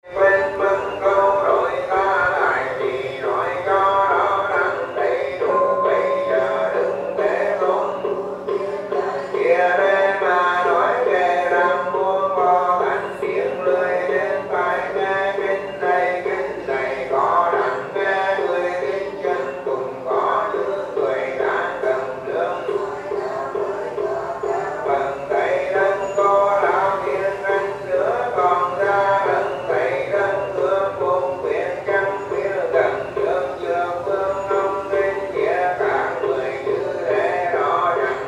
prayer chant vietnam

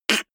beatbox snare harsh damped

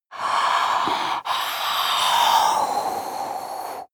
breath female eerie

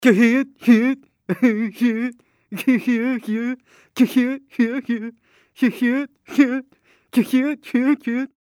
laugh male

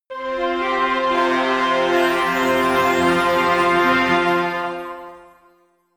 medieval jingle orchestral neutral

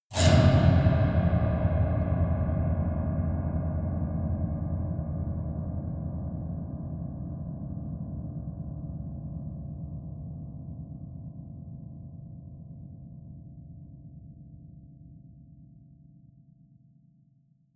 piano twang multiple fast dull

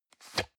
plastic can small lid screw single open

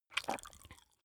swallow food

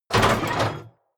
chest metal medium open

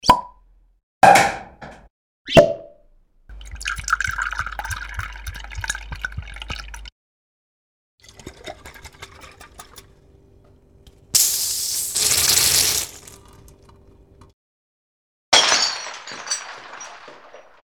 wine cork mix

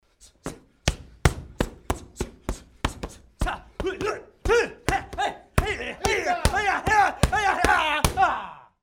karate sparring hand pads

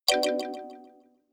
notification kalimba delay minor high

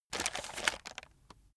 chocolates take hard

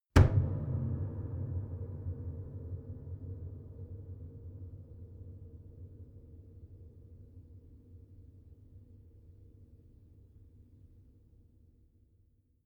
piano body hit

